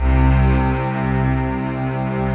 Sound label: C2 CELL; AKAI S-Series CD-ROM Sound Library VOL-7; CELLO+VIOLIN